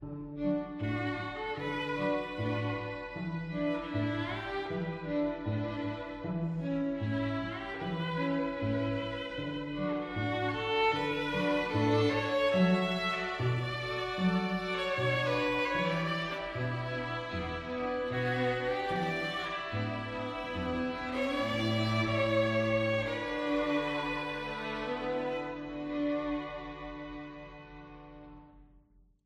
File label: String Quartet; Soleil String Quartet